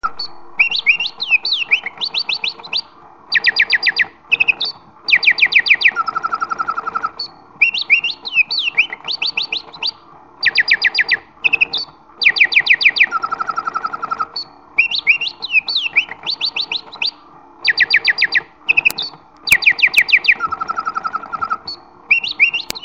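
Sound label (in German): 2 Vögel; bontemps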